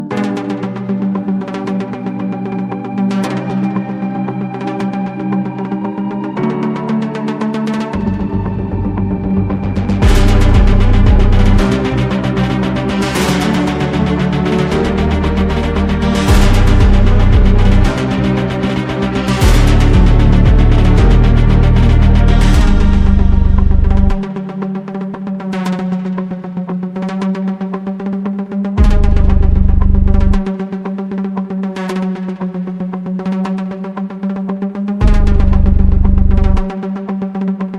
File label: bgm; tenet